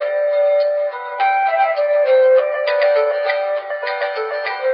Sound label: flute fluttering, Phonky loops